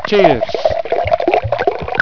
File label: cheers